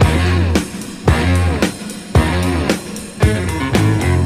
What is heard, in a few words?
full, Loops Phonky